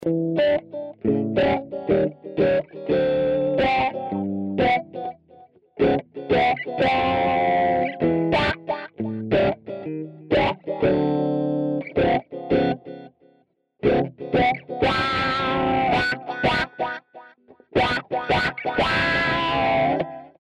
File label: wah crunch 1x12; AmplitubeLE